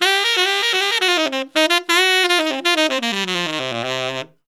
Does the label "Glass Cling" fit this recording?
No